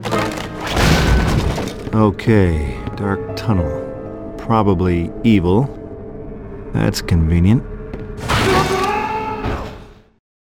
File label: evil dark tunnel; Evil Dead: Hail to the King